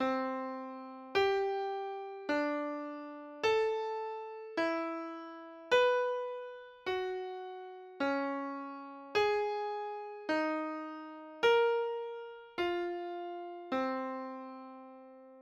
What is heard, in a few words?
Circle of Fifths Ascending within octave